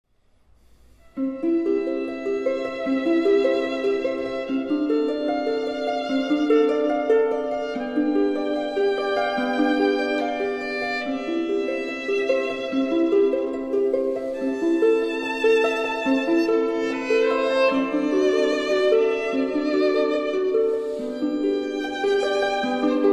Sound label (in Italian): Ave Maria Gounod Arpa e Violino